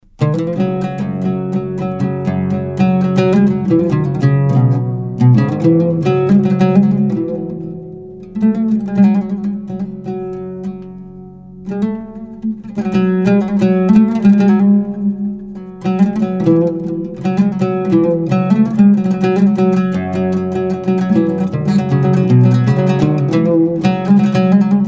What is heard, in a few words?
solo oud